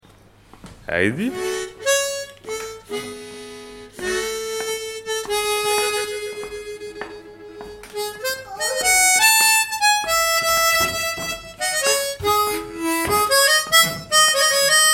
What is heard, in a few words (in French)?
Musique